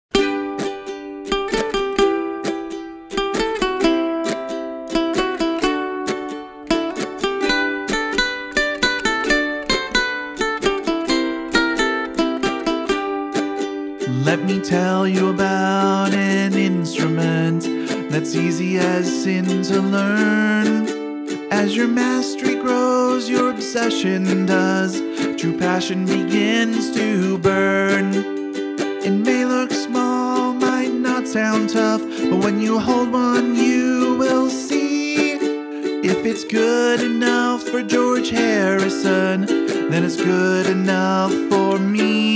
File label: Rock the Uke